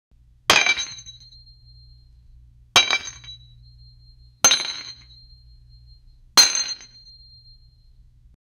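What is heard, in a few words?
the sound of metal hitting, dice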